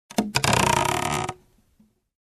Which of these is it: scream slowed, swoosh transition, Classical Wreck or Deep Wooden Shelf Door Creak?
Deep Wooden Shelf Door Creak